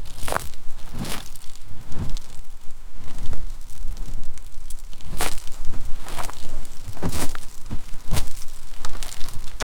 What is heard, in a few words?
dirt churning subterranea; subterranean